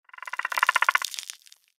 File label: mirelurk idle; npc